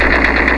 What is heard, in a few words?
GUN; Death from Above